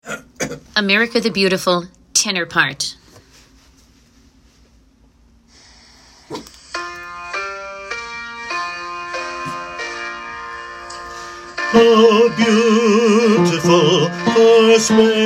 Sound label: tenor part; patriotic songs